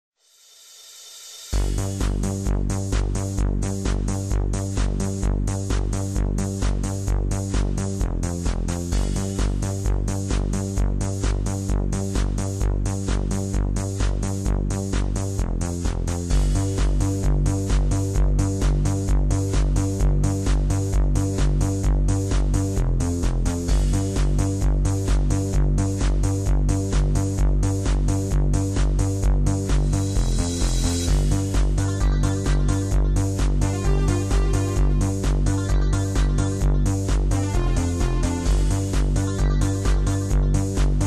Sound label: Techno